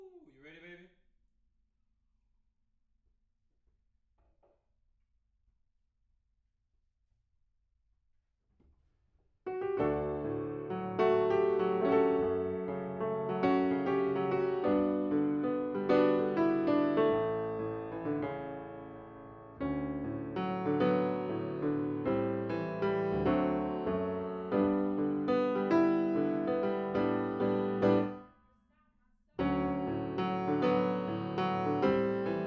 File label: Piano